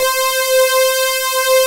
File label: PWM SYN; Pro-Rec - Synthsonic